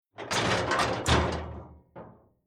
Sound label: Farm Cattle Handler Crush Engaging Catle Grab Lever Long Metal Rattle